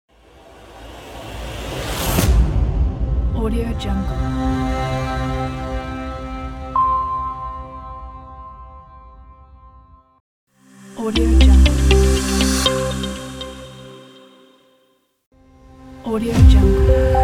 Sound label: logo pack